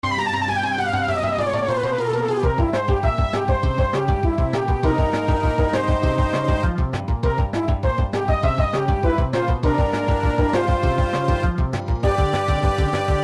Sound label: Wild Battle Alt